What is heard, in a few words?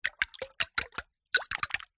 spoon stir hot water tea or coffee in mug